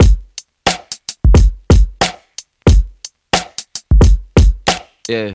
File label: 90 bpm hq drum beat d key, Free drum loop sample - kick tuned to the D note. Loudest frequency: 1201Hz